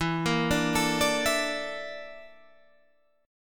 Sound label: A sharp Major 7th Flat 5th E